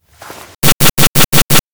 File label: stop turn; anemoi